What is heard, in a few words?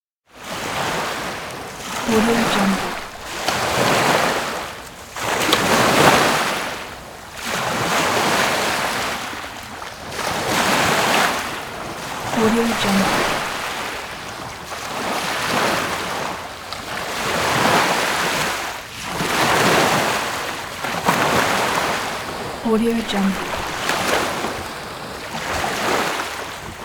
ocean shore waves